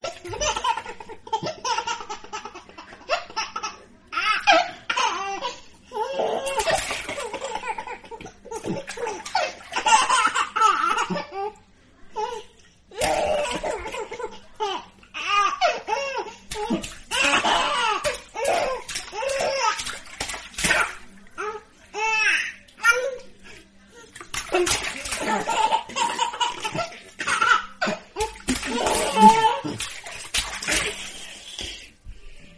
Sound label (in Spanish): risa y risa bebe